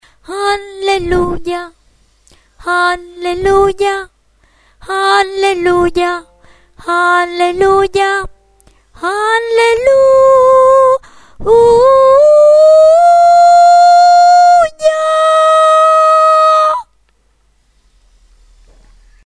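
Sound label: soprano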